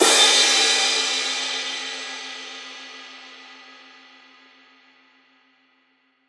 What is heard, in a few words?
Crash; Hats and Cymbals